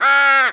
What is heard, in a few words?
squawk call, crow; critters